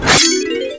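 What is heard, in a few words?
power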